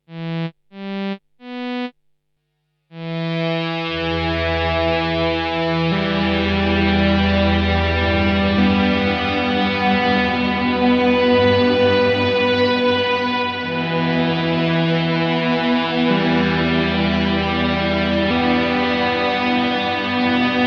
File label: Cathedral plus Octave; Synth Mercury X